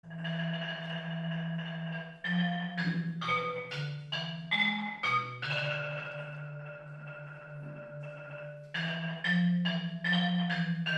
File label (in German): marimba ausschnitt